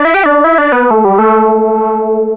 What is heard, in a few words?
Synth Lead; Sonic Foundry (Sony Creative Software) - Rondo Brothers Trip-Hop Loops & Samples; Liberation